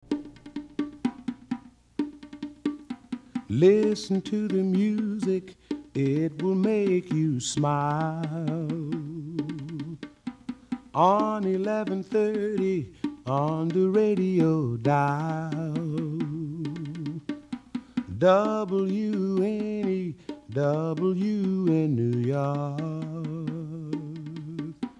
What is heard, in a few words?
Calypso jingle